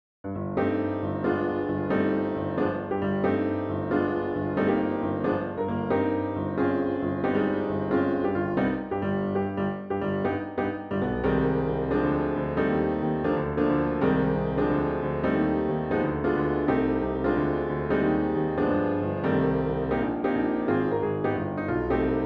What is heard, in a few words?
Piano Only